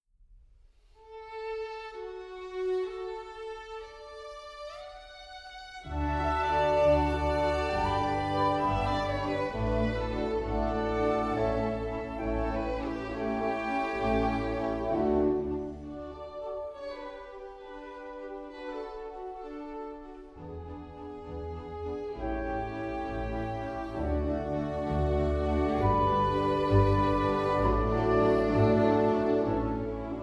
G Major, Violin; Violin Concerto No. 3 In G Major, K. 216: II. Adagio

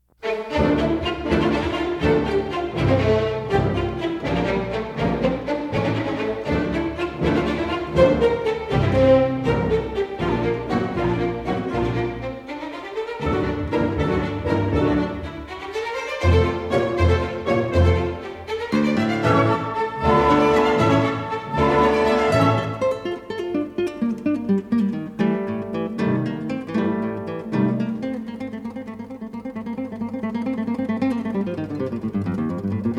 Concerto in D for Guitar & Orch

